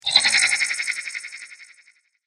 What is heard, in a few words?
Creepy Laughing Insectoid Wah Ha Ha; Alien Sound Effects; science fiction sound effect